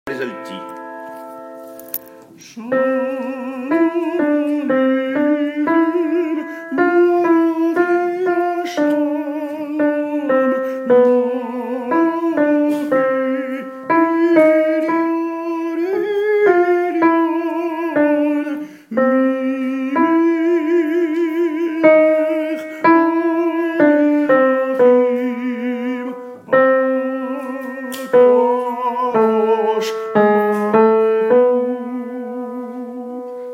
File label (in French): altos